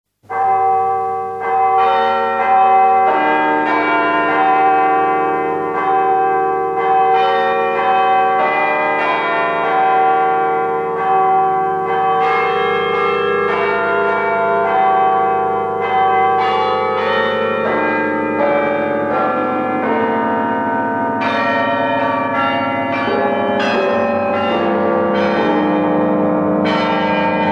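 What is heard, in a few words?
zvuki kolokola, bell sounds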